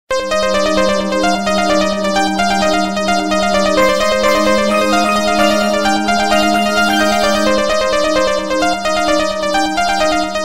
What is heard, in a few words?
motorola moonlit haze ringtone